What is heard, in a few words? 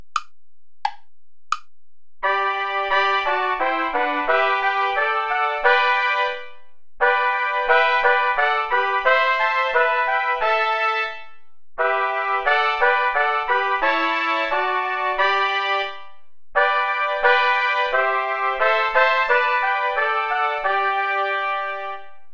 play along without trumpet